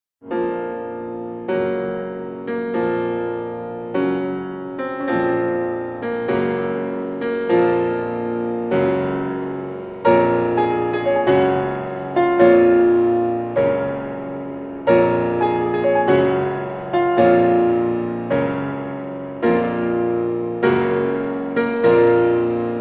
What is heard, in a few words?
Death music Chopin b minor; Long Live the Queen